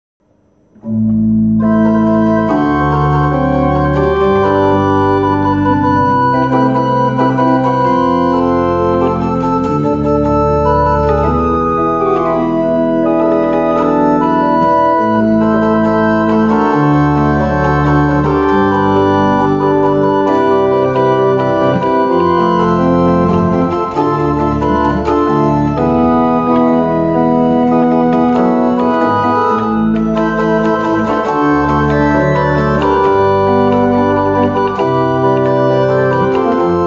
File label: Jazz Pop